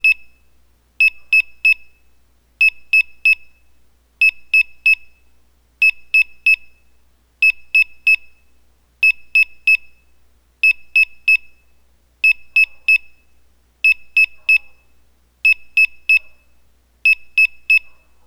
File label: DI DI DI TONE, Piezo Siren